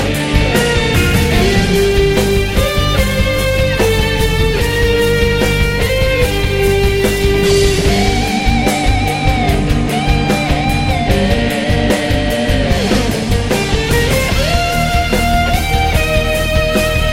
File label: Theme Metal; Witcher; meloboom